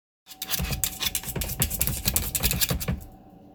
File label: Scratching